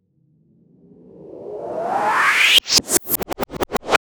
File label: reverse sped up sound